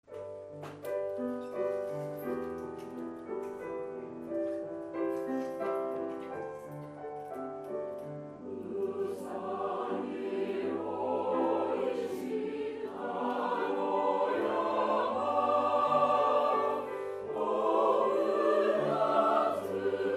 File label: Base Singing